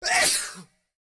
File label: Closed Hats